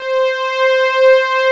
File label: OB LEAD; Pro-Rec - Synthsonic; JV ANALOG